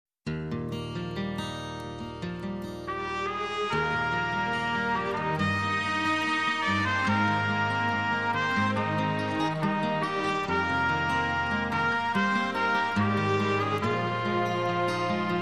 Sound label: Acoustic